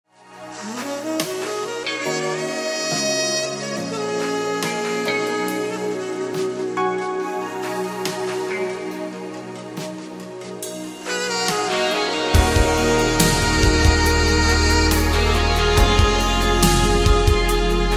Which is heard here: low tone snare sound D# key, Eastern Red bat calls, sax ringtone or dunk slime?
sax ringtone